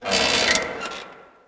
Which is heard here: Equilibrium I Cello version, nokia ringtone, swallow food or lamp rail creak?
lamp rail creak